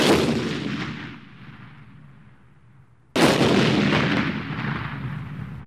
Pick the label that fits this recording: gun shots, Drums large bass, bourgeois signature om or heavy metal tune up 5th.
gun shots